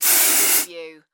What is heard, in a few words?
Hairspray Single Spray